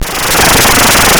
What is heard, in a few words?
YM Noise; NOISES; ChipShop Deluxe; ChipShop Hits